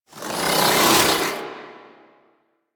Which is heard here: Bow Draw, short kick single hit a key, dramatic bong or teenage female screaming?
Bow Draw